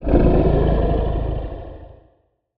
Low Roar Short; Monster Sounds; TheDeclineOfWarriors; Monster Sounds Volume II

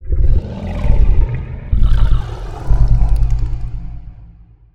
Alien Distant, Monster Sounds; The Decline Of Warriors; Monster Sounds Volume II

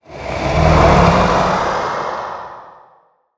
Zombie Agressive, Monster Sounds; The Decline Of Warriors; Monster Sounds Volume II

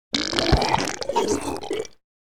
Zombie Disgusting Sounds, Monster Sounds; TheDeclineOfWarriors; Monster Sounds Volume II

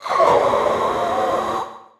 Zombie Agressive; Monster Sounds; TheDeclineOfWarriors; Monster Sounds Volume II

